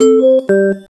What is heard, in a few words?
in a quiz show quick, correct answer